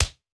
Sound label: Punch; Combat